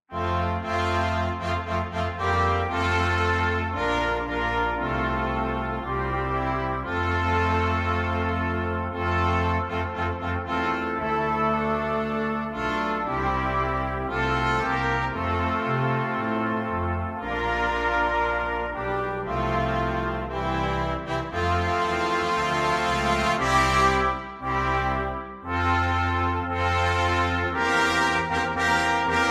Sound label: Solomon islands anthem